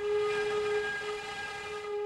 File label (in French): tube